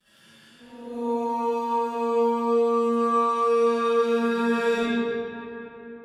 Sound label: Vocals Man Singing Note